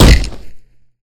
Fall Hard, Impacts; zps

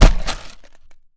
IED Drop; zps; Weapons; Explosives

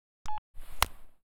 Phone Trigger; zps; Weapons; Explosives

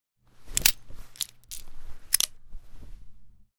357 Unload, Magnum; zps; Weapons; Firearms

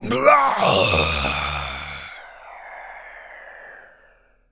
Death, Zombies; zps; Carrier

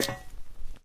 ladder, Footsteps; zps; Foley

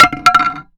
Bat Collision; zps; Foley

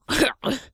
pain, Zombies; zps; Vanessa

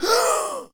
Zombies Male Jump; zps; Male1

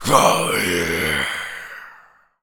Berserk, Zombies; zps; Carrier

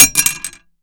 Machete Collision; zps; Foley

